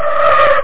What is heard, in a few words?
skid; spacecase